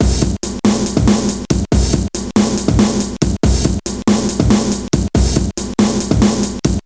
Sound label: Drum